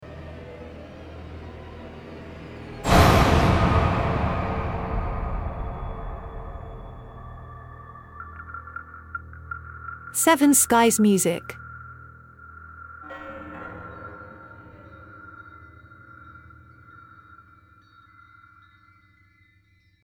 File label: Scary sting